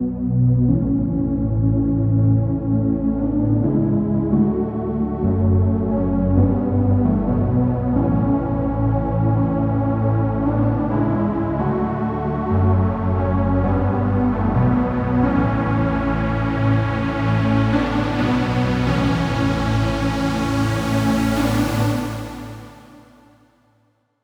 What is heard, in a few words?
Intro 132BPM Pad